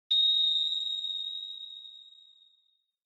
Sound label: Flash High Long; Onoma; オノマトペ 光る; 高 長